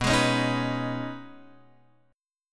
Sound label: B 6th Add 9th B down Guitar Open F; strummed